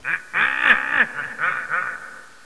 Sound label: boss laugh